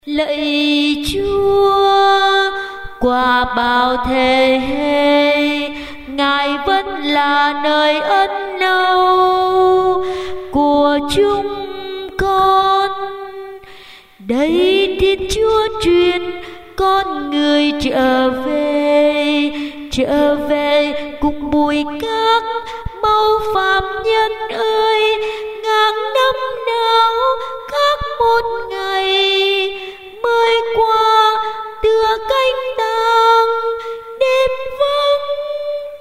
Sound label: tenor basso